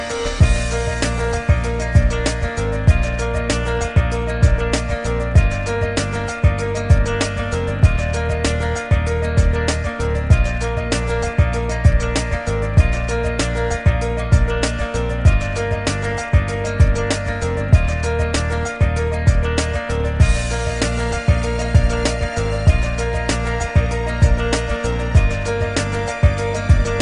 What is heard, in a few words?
inspiring music